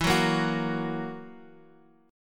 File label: E Suspended 2nd Flat 5th E down